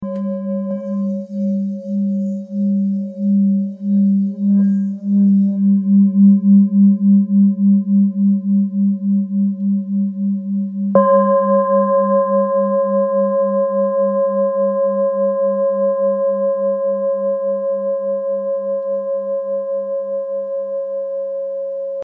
Bol ancien sol

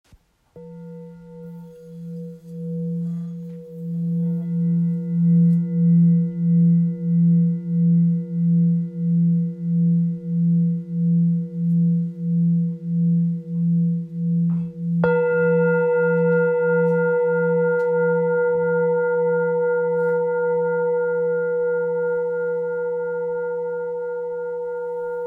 Bol full moon grave fa 1.39kg

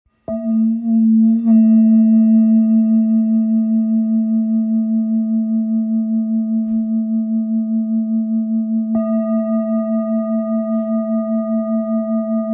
Bol grave arbre fleur de vie mi, bols chantants tibétains

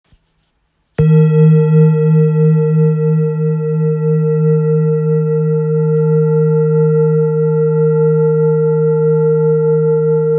Bol bengale la diese

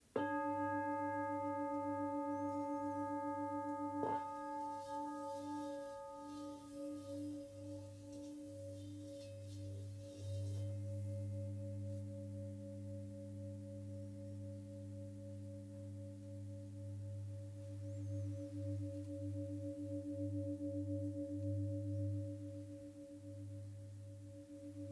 Bol devjam re

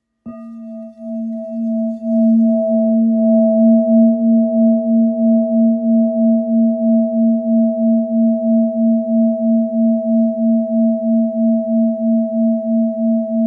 Bol real fullmoon la

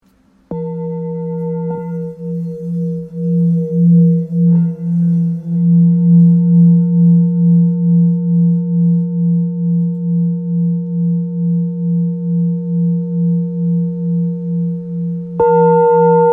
Bol ancien la

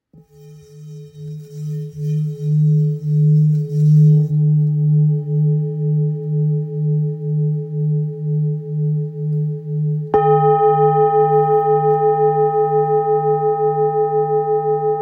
Bol ancien re

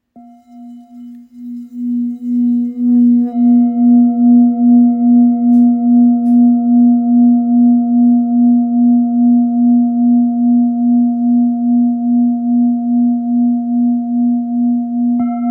Bol real full moon la diese 1.37kg

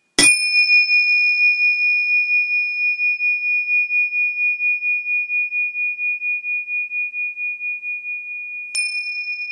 Cymbales 7,5cm mantras

